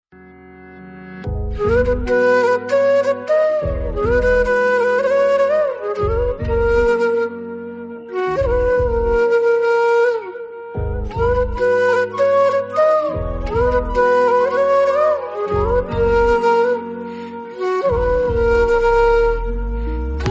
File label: Flute